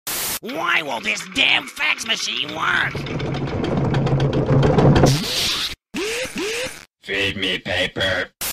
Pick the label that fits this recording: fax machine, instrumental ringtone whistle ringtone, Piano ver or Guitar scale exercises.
fax machine